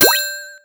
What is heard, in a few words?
coin; Deathwish Enforcers